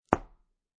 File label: Foley, Book, Hardcover, Close Front Cover; Miscellaneous Office